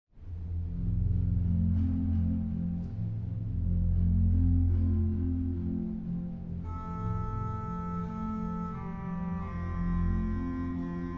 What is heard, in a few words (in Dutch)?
Koraal (hooforgel)